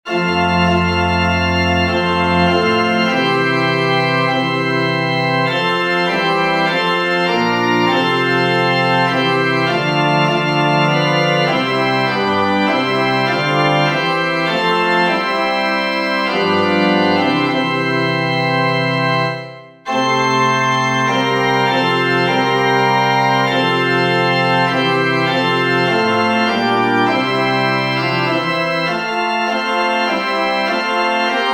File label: major for pipe organ; anonymous come all faithful